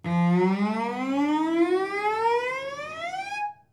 Glissando Cello

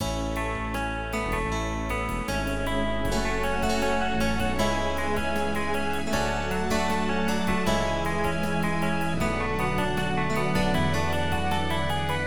stem calm 2 track

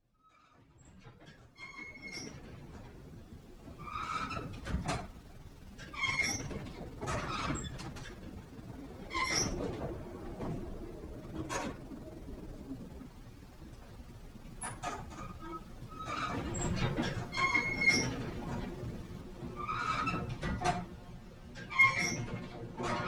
Wind Rattle

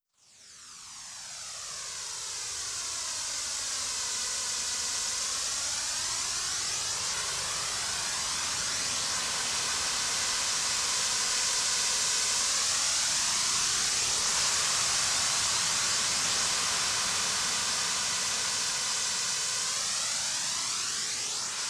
Wind 3 Comb Mix, Alberta Poplar Grove

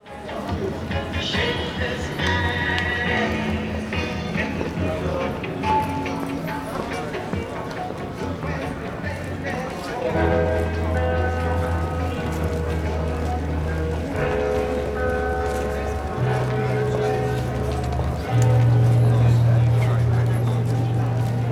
Muzak Carnaby St

